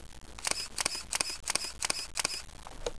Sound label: Canon EOS 350D Digital Rebel XT Burst